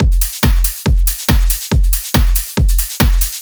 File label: drum Loop 140BPM Full